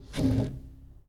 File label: Foosball Blocker Spin